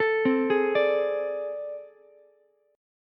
A Minor Major 11th, down, Ukulele; arpeggiated; AmM11; JGuitar

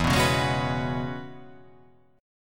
E 6th Add 9th E down

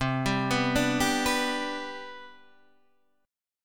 C Major 7th Suspended 2nd, chord

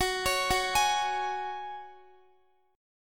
Gb Suspended 2nd, Guitar 4E,4A,5D,5G,5C,6F; arpeggiated

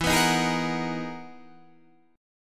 E-Major Sharp 11th E, Guitar DADGAD

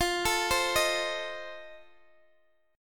F 7th F down Guitar Open D

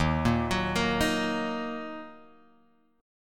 Eb Minor Guitar Open G

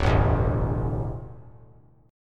D Suspended 2nd Suspended 4th D down; strummed

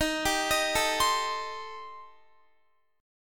D sharp 6th Flat 5th, D sharp, down, Guitar

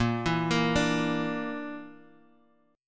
Bb Major Flat 5th, Guitar, Open F; 10,11,10,9,x,x; arpeggiated

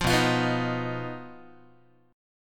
B Major Flat 5th B down Guitar; BMb5; strummed; 8,7,8,9,7,x

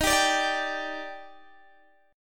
Eb Major 7th, Guitar DADGAD; x,18,17,19,17,17; down

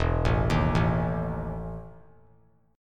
E Major 7th Suspended 4th; arpeggiated; JGuitar

